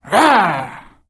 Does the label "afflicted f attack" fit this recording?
Yes